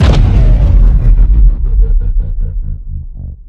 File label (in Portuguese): som arma celestial